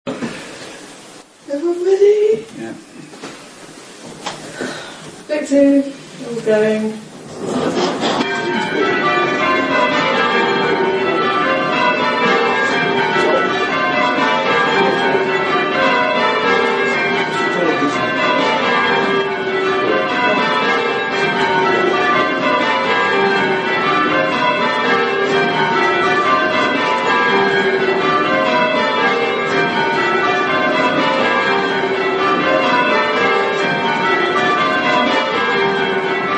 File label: Grundisburgh Peal Grandsire, campane; I campanari inglesi suonano per Bergamo; campane per gli sposi dall'inghilterra